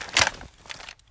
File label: famas clip out; weapons; fokku tc famas